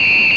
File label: referee